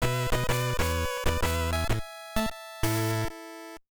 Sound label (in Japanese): 成功！; Medarot